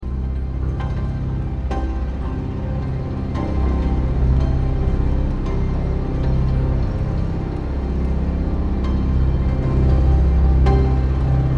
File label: Voltage Music